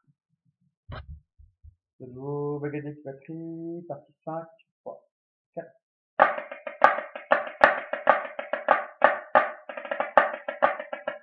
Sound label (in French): SOLO BAGADIG